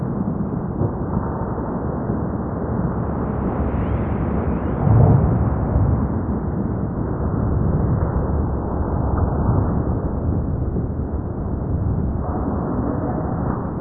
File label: amb industrial muffled lp; video games; ambient